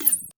frostshot; LoL; ashe

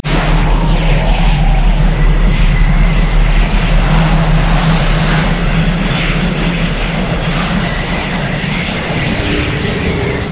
r trail

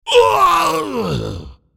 Minotaur dying

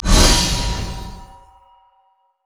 battlecry; LoL; xinzhao

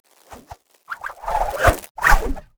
dragon; lulu dragontrainer

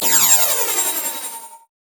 prismatic wave; LoL; lux

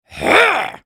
effort; Wolfman; Character Voices - English; en US

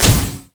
monkeyking spin to win hit big; LoL

